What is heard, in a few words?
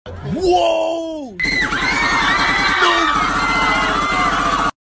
gun